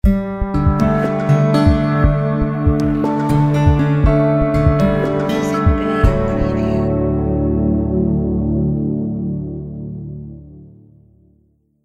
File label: Soft Guitar